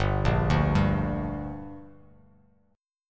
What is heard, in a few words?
G Minor G; arpeggiated; JGuitar